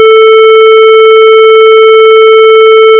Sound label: Square Wave